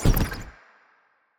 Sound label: projectile; Shelter